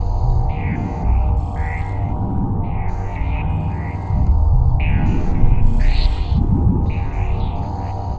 Machine Samba; Sonic Foundry (Sony Creative Software); Ma Ja Le Ethereal Textures

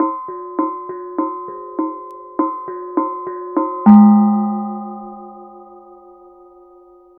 KENONG; Spectrasonics; Heart of Asia; KENONG PH

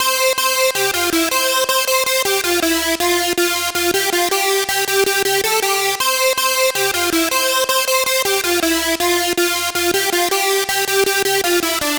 Dance Synths, Hardcore Loops, Synth Loops

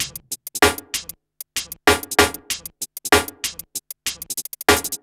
beat, Hip Hop R n B; USB Soundscan vol.19; AKAI

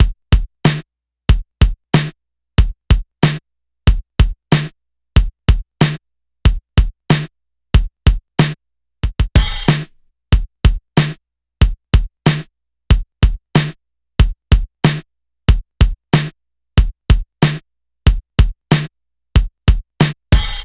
drumloop, Drum Tracks, Smooth RnB

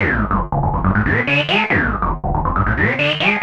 Atonaloop, Industrial Analog

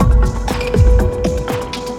Ambi Groove

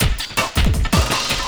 LOOP, 162 BPM, Drum & Bass

